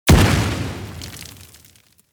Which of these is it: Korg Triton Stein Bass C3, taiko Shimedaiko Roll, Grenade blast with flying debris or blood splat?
Grenade blast with flying debris